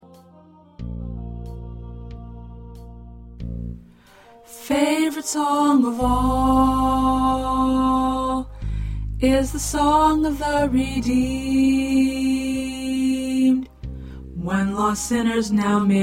Tenor, choir

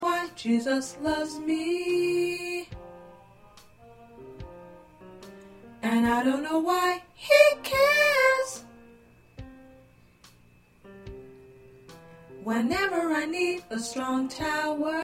P Soprano

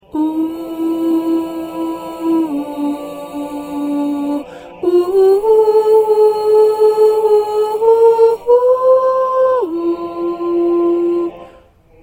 Soprano

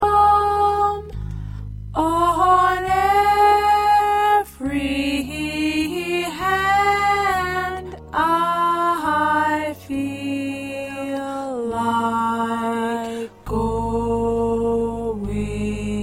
Alto, choir

